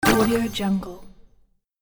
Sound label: jelly bonus hit